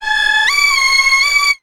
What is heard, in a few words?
Beat